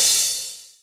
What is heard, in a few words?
cymbal